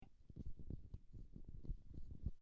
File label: Hand Sennheiser e965